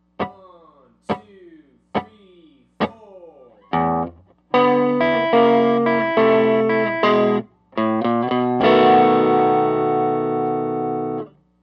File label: Blues Turnaround in E